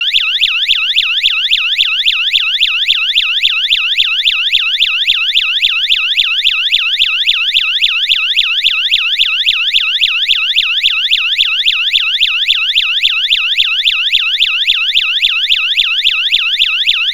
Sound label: POLICE CAR, Piezo Siren